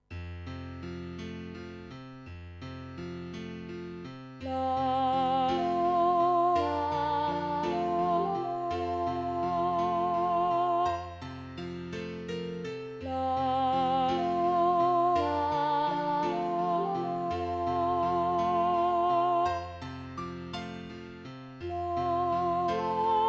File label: bird fly high alto, ladies choir